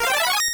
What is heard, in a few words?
Chime; Kabuki Quantum Fighter; NES